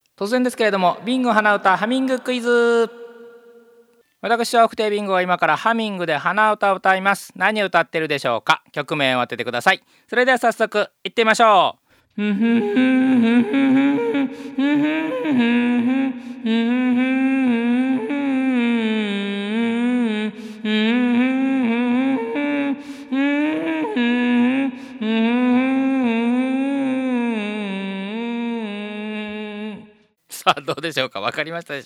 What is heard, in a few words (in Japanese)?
hanauta